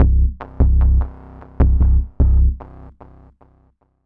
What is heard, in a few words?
Booster Bass; Zero-G Smoke